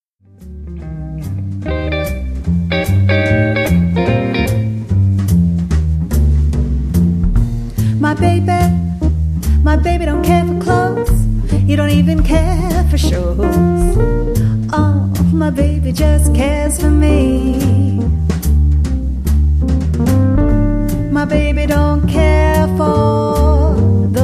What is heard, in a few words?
quartet with piano vocal